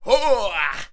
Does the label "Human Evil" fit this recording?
Yes